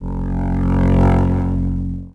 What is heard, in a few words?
repulsor Pallet Lift; machines mechanical